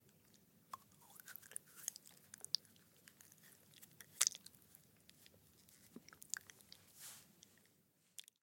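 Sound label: lipstick sounds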